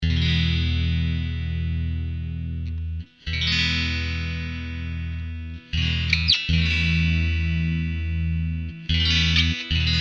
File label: clean bridge mono; axetec